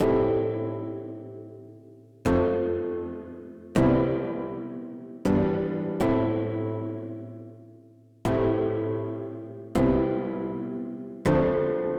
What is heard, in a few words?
Taped 80BPM A min, pianos; 99Sounds; Monosounds; Music Loops; Instrument Loops